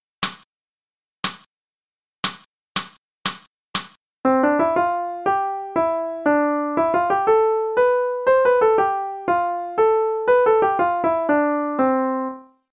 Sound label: Transcribing Rhythms; non-swinging